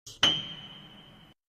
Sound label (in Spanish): sonido de piano; de dross